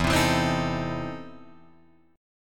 E Major 7th Suspended 4th Sharp 5th E, Guitar, Open F; EM7sus4#5 strummed